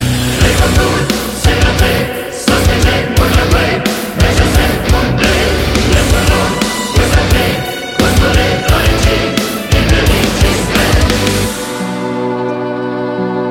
Some Gothic Ringtone

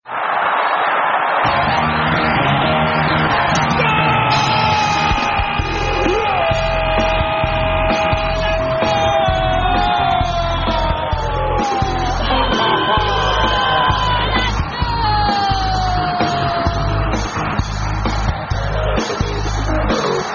Rock; Goooool

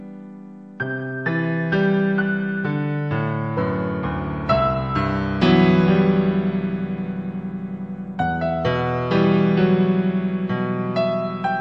Sadness Piano

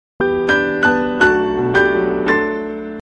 Simple Sms New

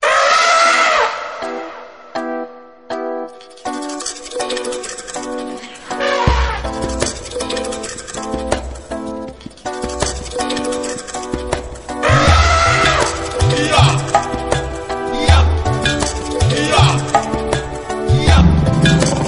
Kongo Africa

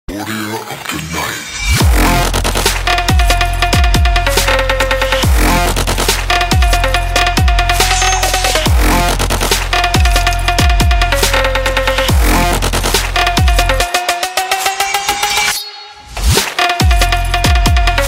trap

